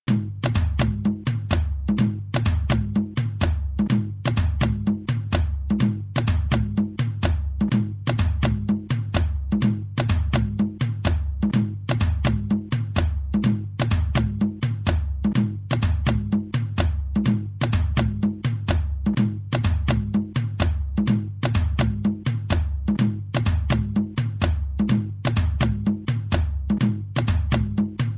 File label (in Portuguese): base atabaque